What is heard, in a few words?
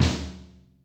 Blaaaghf, Electric Snare, Yamaha DTXpress